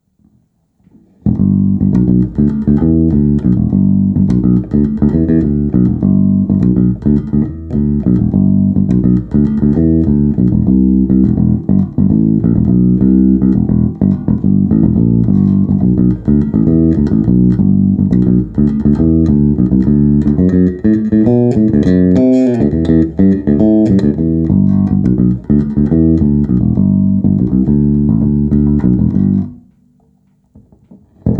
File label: FINGER, bass; olympus